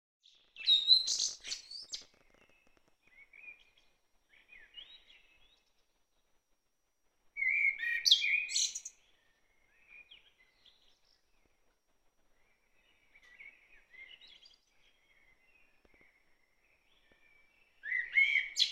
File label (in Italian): Merlo verso; A tartufi Guardando il cielo